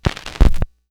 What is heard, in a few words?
Needle Drop Old, Vinyl Record Samples; Needle Noises